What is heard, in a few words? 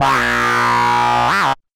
Beat